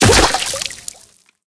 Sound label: bullet hit water; War Thunder